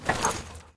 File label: armor rattle; smite; vulcan